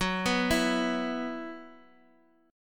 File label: Gb 7th Suspended 4th Gb down Guitar Open D, chord